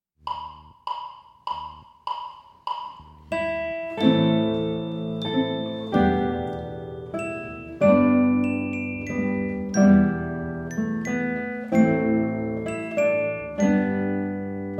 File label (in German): Satz mit Glockenspiel